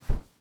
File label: throw